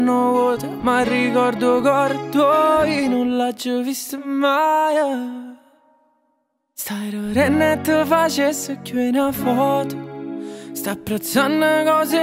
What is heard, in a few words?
Acoustic Version